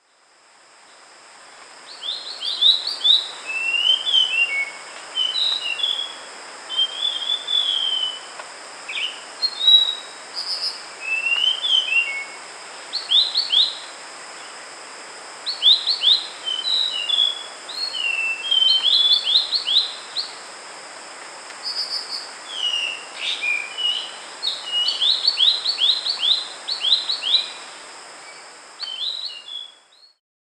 sikatan besar

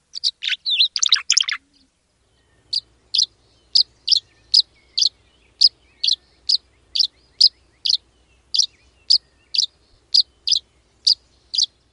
walet rimba

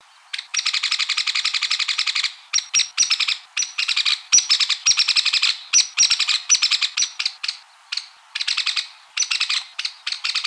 tukik tikus song

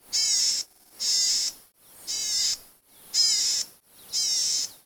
suara cici merah

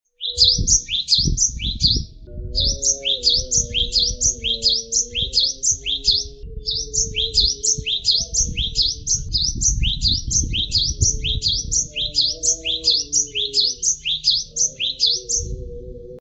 audio glatik wingko untuk kenari isian

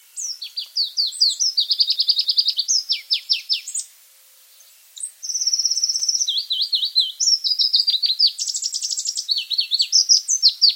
cikrak pallas

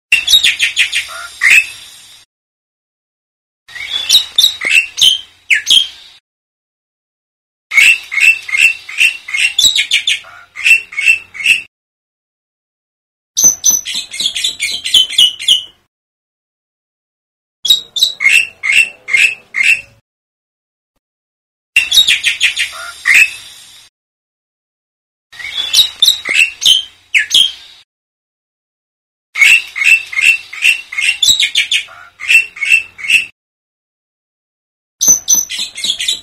suara kicauan burung jalak putih

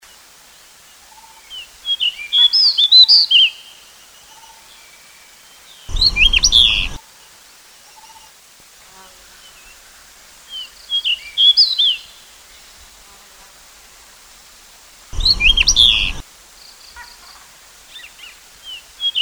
suara kicauan burung decu

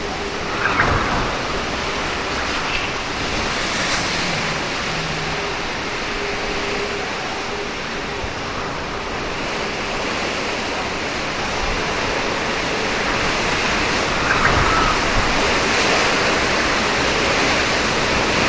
hujan dengan suara siulan burung

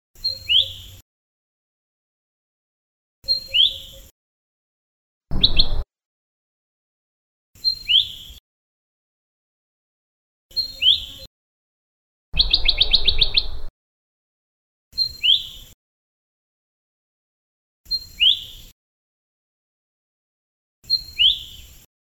suara panggilan cucak hijau mini di alam liar

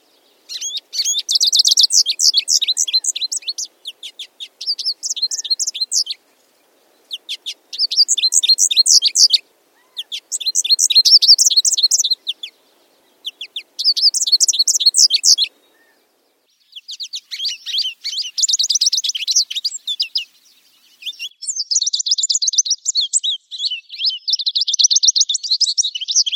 suara american goldfinch

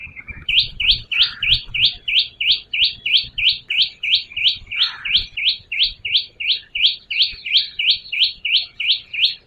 cinenen pisang call

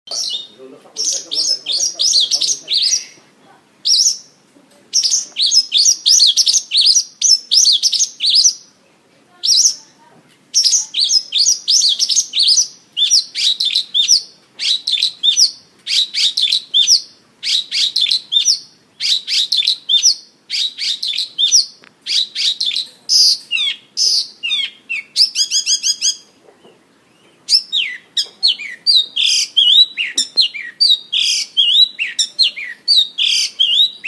suara masteran bailing

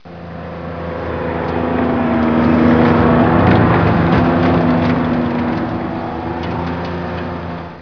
suara mobil

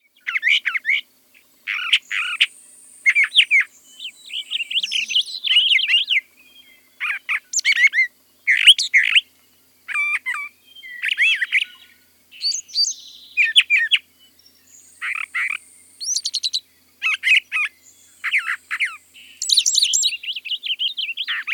suara brown thrasher

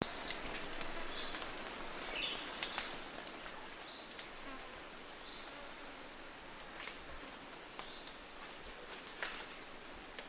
serindit sangihe

